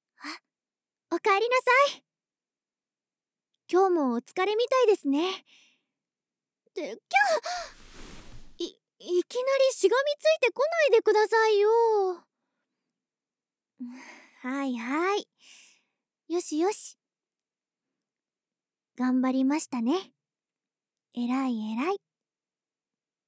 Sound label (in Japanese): ブチュキス